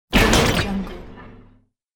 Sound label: damage mechanism close hit; cartoon